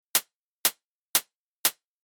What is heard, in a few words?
hat